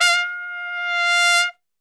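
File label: F 3 trumpet; Best Service; Brass Super Section; TRP SWL; 90 s Sample CDs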